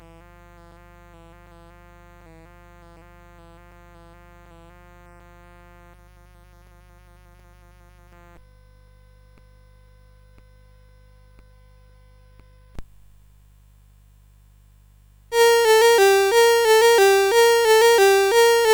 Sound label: Carol of the Bells, CSG8580R5; Sid Editor